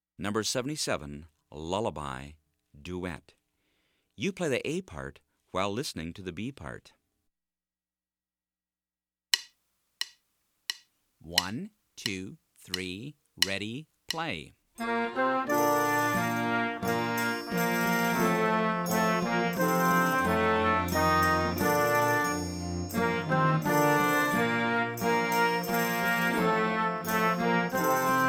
Lullaby Duet

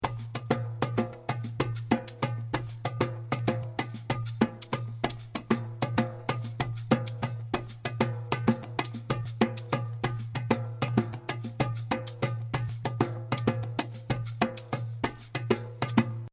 Bonse Aba Accompaniment

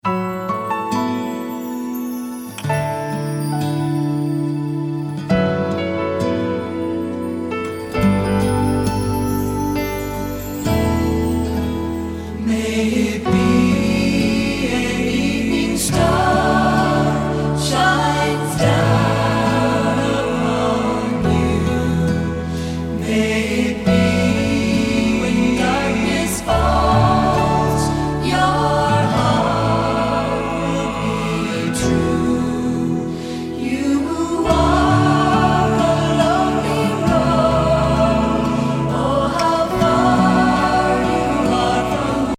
Accompaniment Only

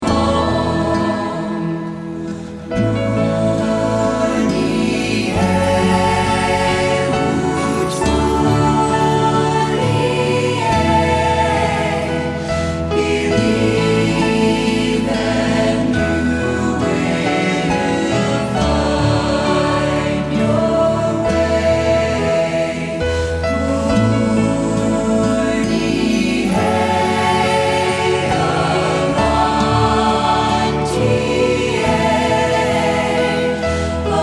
Accompaniment Only

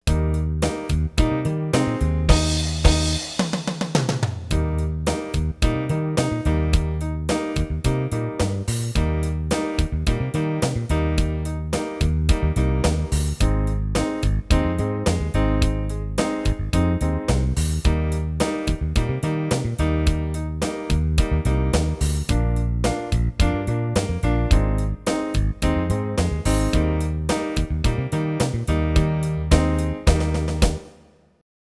First Blues Slow

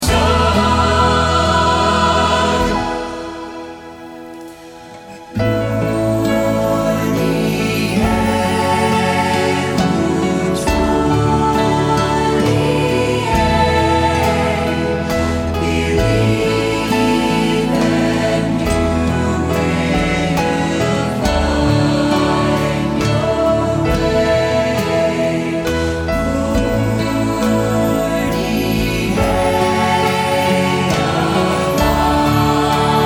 Accompaniment Only

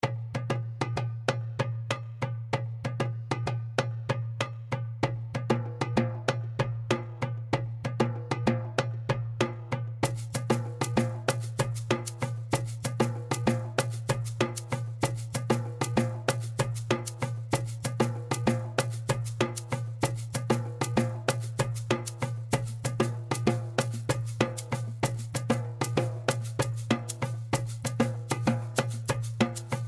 Bonse Aba Accompaniment

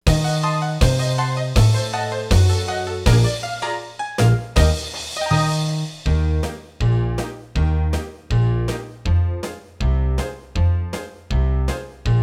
Ragtime Slow

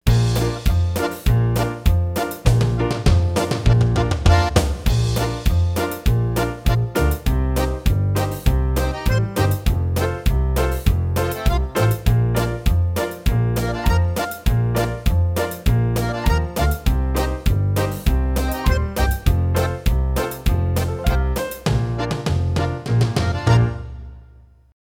Oom Pah Band Bb

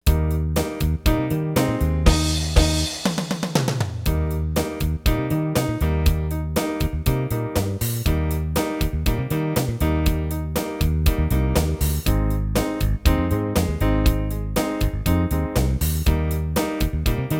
1st Blues Superslow

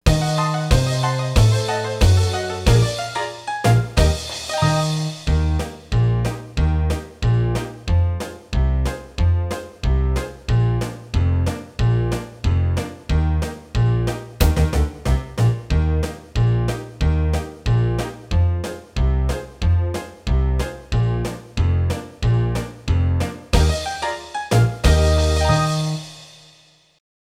Ragtime

